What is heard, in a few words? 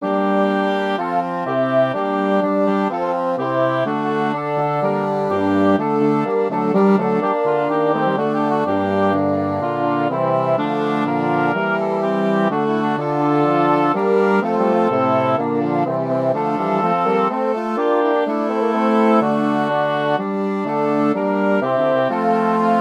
for double reed quintet; adieu anvers